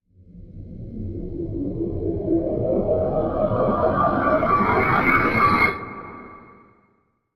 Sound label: Interstellar Void